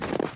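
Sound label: Run Left Gravel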